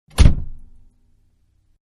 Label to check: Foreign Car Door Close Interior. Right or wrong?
Right